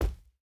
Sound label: footstep boots cloth jump land; Rust